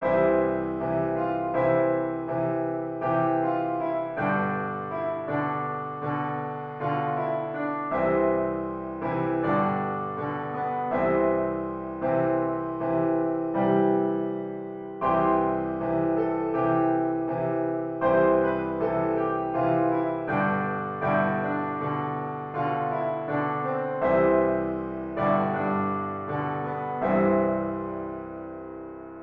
Marche Praguoise

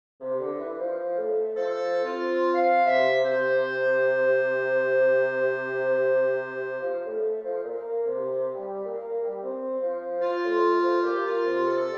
Sonate pour clarinette et basson; 2e Mouvement

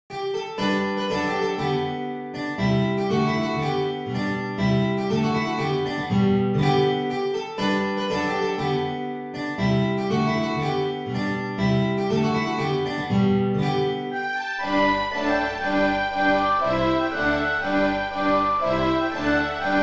Concerto pour guitare

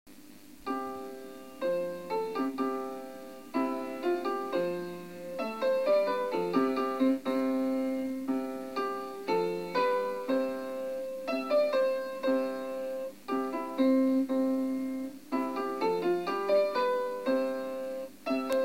Danse Danoise

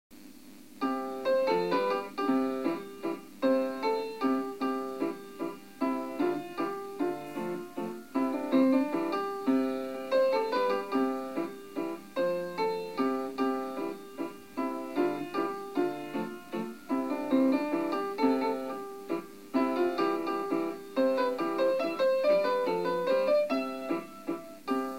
Valse française

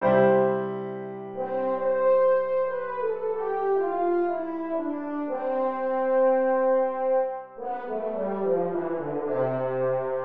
Petite serenade italienne pour cor et piano

